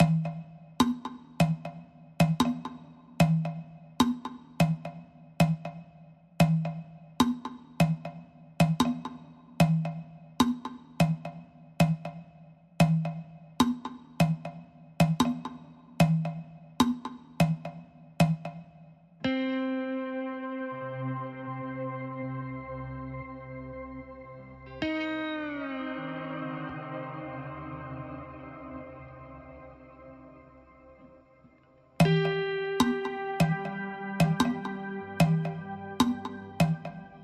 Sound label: Hook Guitar